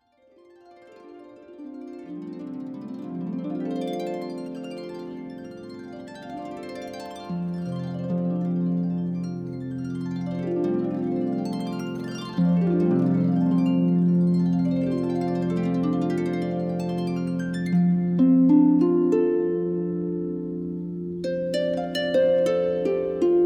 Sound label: Shimmering Waterfall, harp